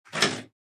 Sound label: IBM Selectric electric typewriter single letter